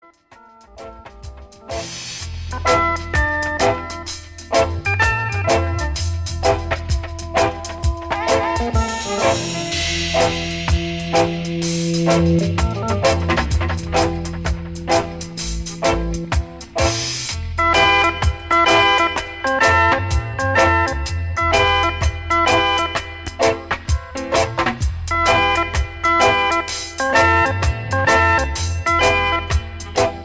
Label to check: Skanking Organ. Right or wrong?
Right